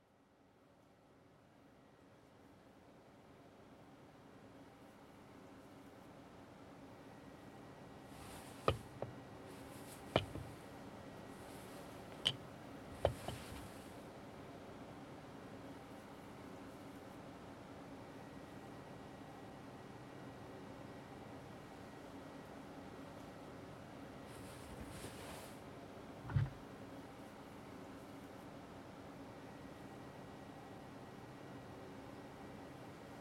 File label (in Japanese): カルメラ焼き作り（点火音、膨張音）ASMR; 和風マカロン？